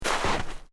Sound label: Footsteps Boots Snow Deep Step; Footstep Foley; Footsteps (Sound Effects:Human)